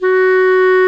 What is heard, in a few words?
Clarinet & Bass Clarinet